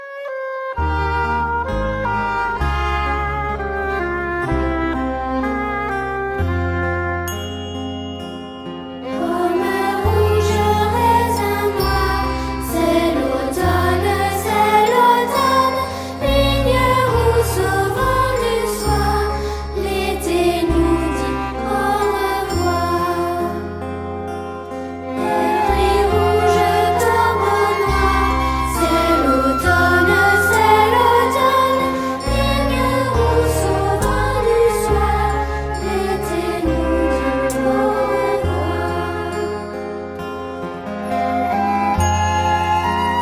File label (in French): Chorale; c'est l'automne